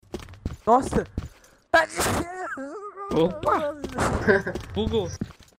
doenza espirrando

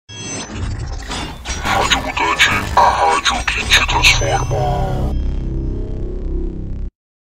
vinheta; transformers

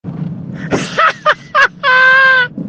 risada

